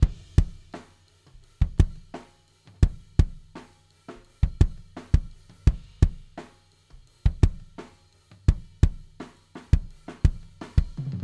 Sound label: debleed kick OFF